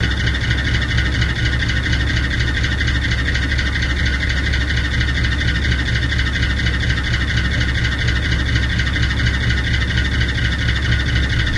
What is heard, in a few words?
broken fan; svencoop